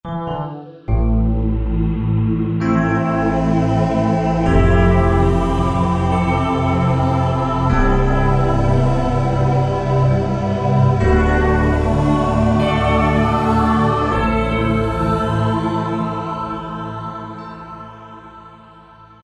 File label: Stratos, Korg M1; Factory preset examples